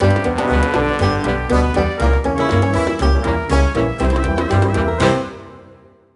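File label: Sting Level Mission Complete, game music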